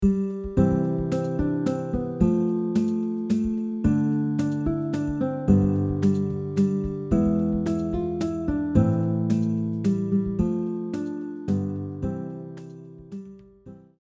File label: guitar; We Wish You a Merry Christmas; christmas songs